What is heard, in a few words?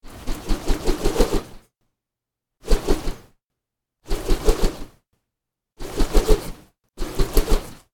Rotating Swooshes